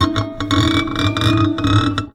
Classical Guitar Extended Technique

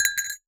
Cowbell Chain, Percussion

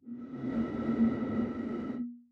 Bottle Blown

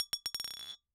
Bottle Struck

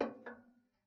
Ping, Kitchen Toaster

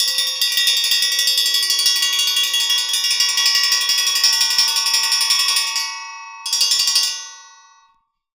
Metal Teapot Stand Tremolo

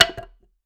Wooden Bar Bounce

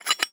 Hole Saw Scrape; Hole Saw Bits

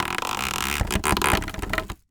Polystyrene Creak

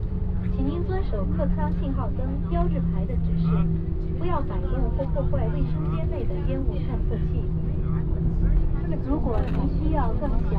Takeoff Filtered Shortened, airports airplanes; China; Sound Scapes

